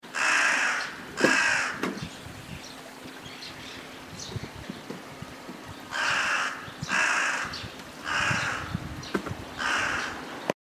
hasibosogarasu, tori

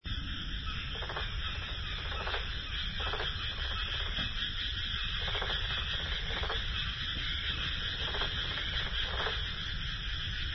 kaeru tonosama

